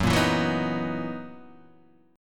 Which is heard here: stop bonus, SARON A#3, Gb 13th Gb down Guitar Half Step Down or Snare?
Gb 13th Gb down Guitar Half Step Down